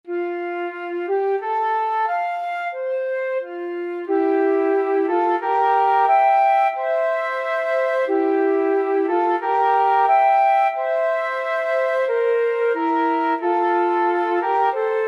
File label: pongauer jodler FL duet, flute duet